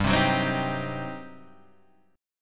G Major 7th Suspended 4th Sharp 5th, G, down, Guitar, Half Step Down; GM7sus4#5